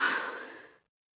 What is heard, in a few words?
Breath; Lewd SFX